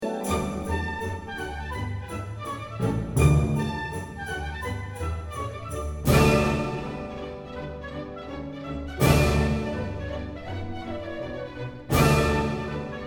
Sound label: Jig; German; Merrie England